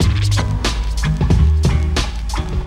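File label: Instrumental Pattern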